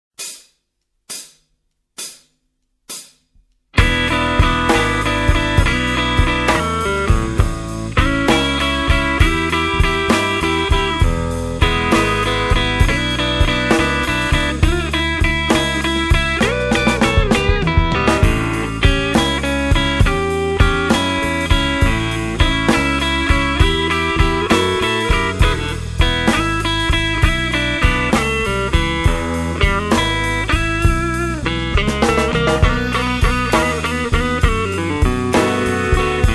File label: blues jazz